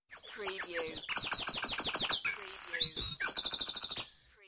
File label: Dawn Chorus in Mold North Wales